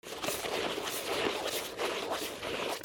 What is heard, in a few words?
FRICTION